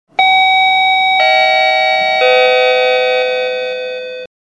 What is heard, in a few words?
chime; polestar